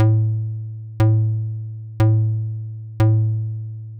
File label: Sallen Key Filter Test; You may notice that the result still sounds like plucking a string instrument, but the sound is a bit more mellow.; Wolfram; AnalogModeling; modelica